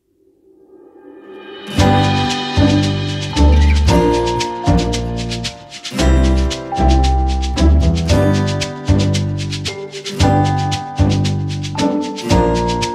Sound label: Background Music